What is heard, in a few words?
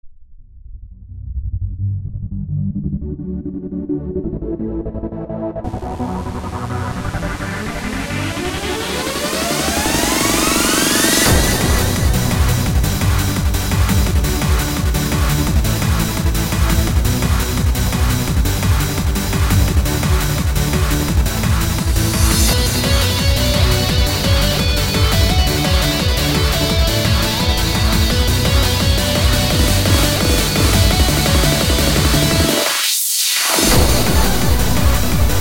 Hard Techno